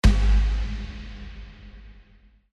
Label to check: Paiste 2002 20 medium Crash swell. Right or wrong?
Wrong